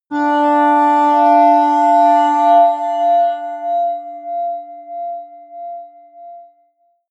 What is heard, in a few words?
atmospheric feedback synthesizer high, drones